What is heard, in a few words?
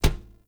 Unarmed Punch Impact Metal; Weapon Impacts; Player; Environment